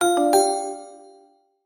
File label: error